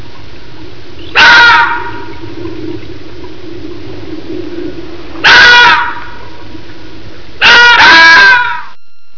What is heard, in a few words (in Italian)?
volpe